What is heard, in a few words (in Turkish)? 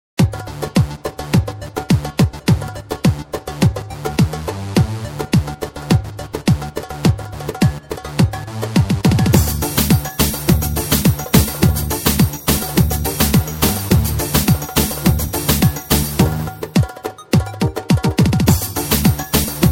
s650 pop tr, s650 turkpop intro ending